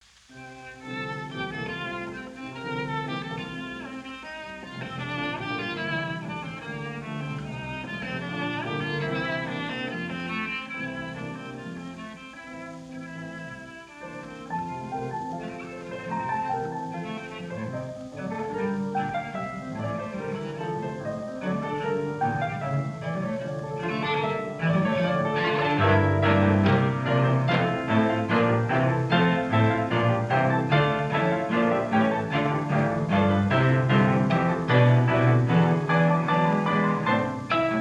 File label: Brahms Cello sonata IV. Allegro molto